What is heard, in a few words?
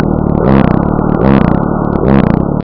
Male Ad Call in vivo Nerve